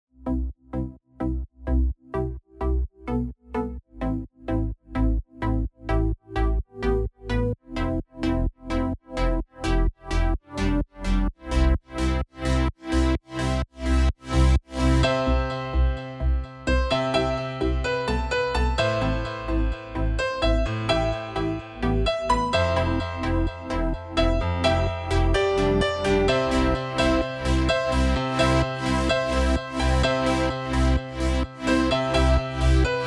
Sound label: Piano Rain